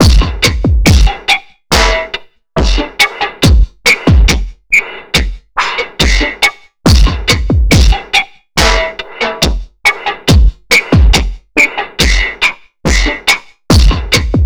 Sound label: TRIPHOP; Funky Xtreams; INIS